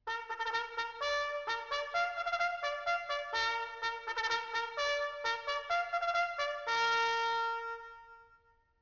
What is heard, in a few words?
recall from drill, bugle sounds